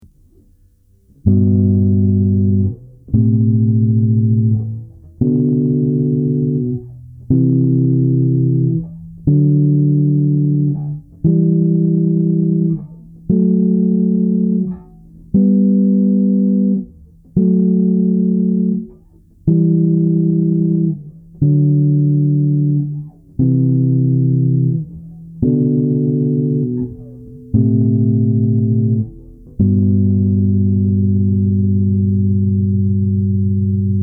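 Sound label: G major Scale in thirds, bass; chords and double stops on bass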